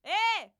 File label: Scream